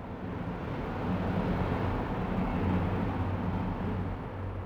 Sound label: city